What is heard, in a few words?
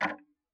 Tick, Clocks Kitchen Clock